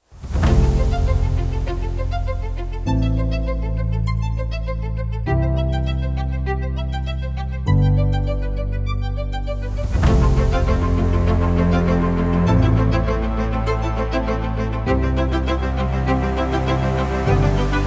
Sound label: Modern Epic Violin; AdobeStock